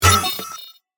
die; Max Steel - Match And Destroy

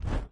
whoosh; Bunnicula - Juicy Bites

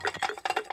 mix loop; Dorothy And The Wizard Of Oz - Cookie Magic

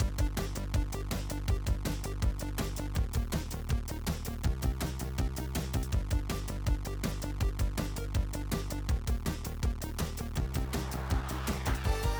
music; Ben 10 - World Rescue

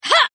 hit; april; Power Rangers vs Teenage Mutant Ninja Turtles - Ultimate Hero Clash 2

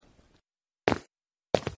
step Slow; Legends of the Hidden Temple - Unlock the Past

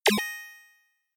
coin pickup; Henry Danger - Day Of Danger!

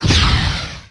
zMissile; Teen Titans go! H.I.V.E. 5 Robin Vs See-More

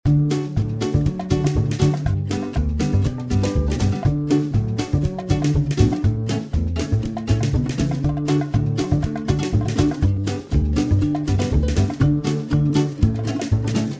music gameplay; Nickelodeon - Back to School Search

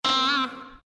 fire held note release; Adventure Time - Elemental; ThrillGames

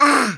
hit

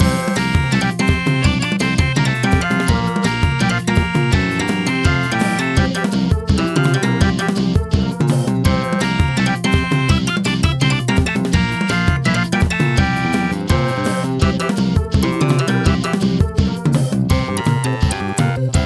music fast; The Loud House - Extreme Cardboard Racing; NextlevelGames

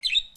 chirp; Flap 360

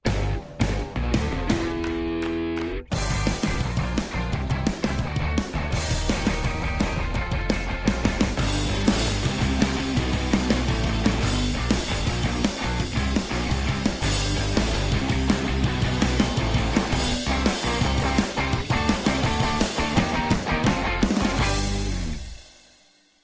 music splash generic; The Powerpuff Girls - Trail Blazer; NextlevelGames

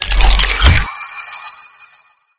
power up bricks; Gotham City Speed

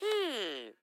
mmmm; Bunnicula - Rescuing Harold

